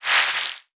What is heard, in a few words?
Multimedia Internet CD-Rom Flash Wipe Electronic